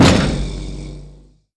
Small Blast Door SHUT; gesource

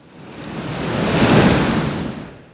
Wind Gust; gesource; DuClare Chateau

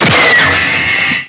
rocket launcher fire; gesource; xshemps customs; weapons

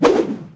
knife throw; gesource; weapons

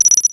mine draw beep; weapons

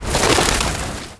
pigeon fly; gesource; DuClare Chateau

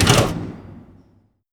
Small Blast Door OPENED; gesource; doors

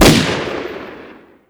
d5k fire npc, weapons

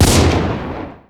dd44 fire, weapons; npc

